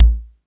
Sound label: Yamaha TX-81Z BD; drum machines